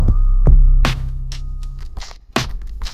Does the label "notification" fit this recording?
Yes